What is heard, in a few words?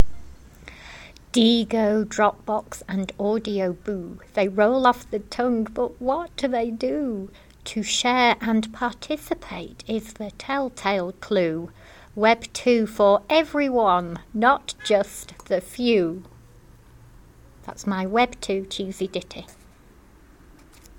web 2 0 ditty